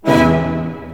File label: HIT, synths; Rubber Chicken Software Sample Bank